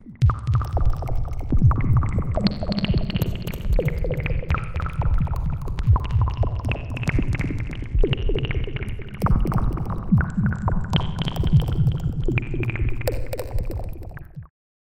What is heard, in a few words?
SEQ Liquid Pattern; Synthractive; syntheway